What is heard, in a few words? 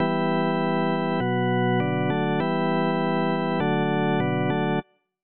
Normal Loop Organ; sprunki incredibox